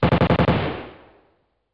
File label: Machine Gun Fire